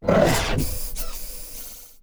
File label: hurt, cylarkan, creatures; combat; CosmicRageSounds